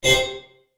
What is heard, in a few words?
beep